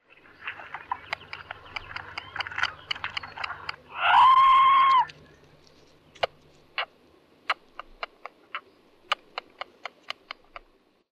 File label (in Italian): scoiattolo